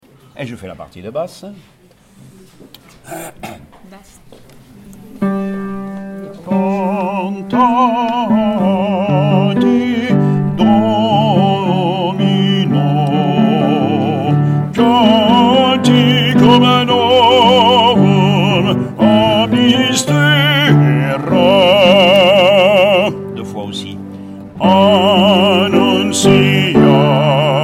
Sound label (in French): basse; cantate domino